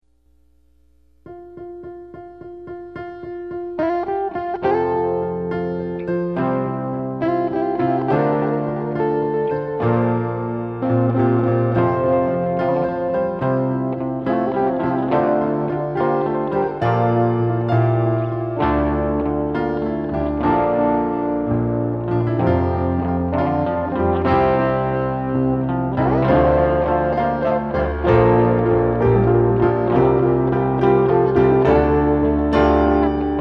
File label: detached piano guitar